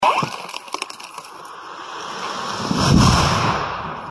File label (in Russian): derevo bystro upalo